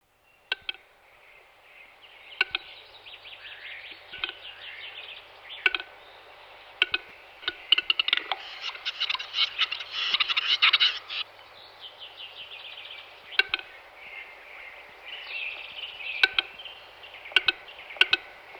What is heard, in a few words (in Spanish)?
urogallo